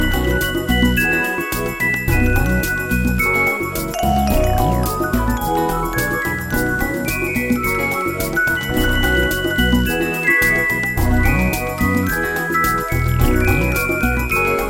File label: Samba Acoustic